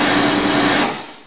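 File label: push metal; Hexen2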